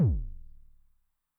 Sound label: BD MOD [PItch+Depth], 909mod, BASS DRUM; classic machines